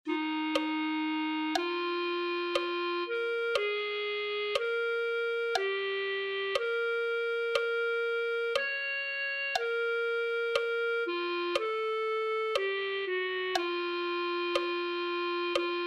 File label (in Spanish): clarinete